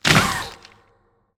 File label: Trilobite dig